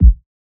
kick subtastic; Drum One Shots; SD MIDNIGHT Sample Pack; Slate

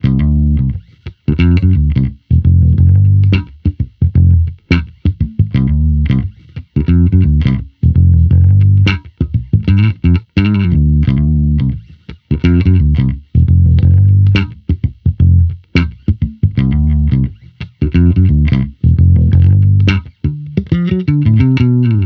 Amped loop 87 D, Electric Bass Loops; Slate Digital BASSHOLE Sample Pack

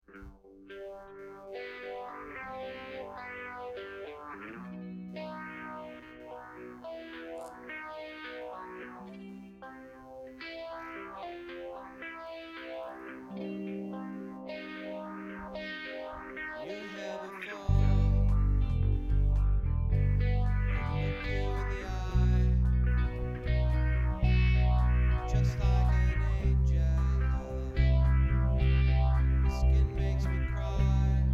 Strings Mix

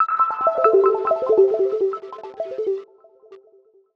pluck distance C; Melodies; One Shots; Slate; AURORA Sample Pack

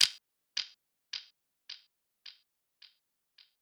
percussion oneshot lines; Pop; Drums; SD PASADENA Pop Sample Pack; Slate

